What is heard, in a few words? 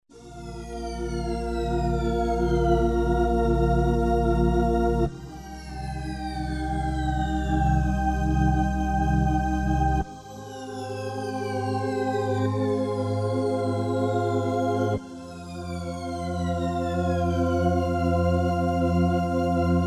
pad EG, Yamaha TG-500; Pad: short decay